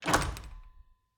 Cabin, front door, split door, oak, large, iron fittings, close, hyper, wide, 2 mics; Eiravaein Works; Vaeyan IV